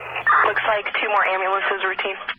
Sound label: death; Ready Or Not